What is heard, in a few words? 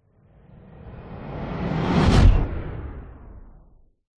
sound design whoosh heavy hard fast impact; zapsplat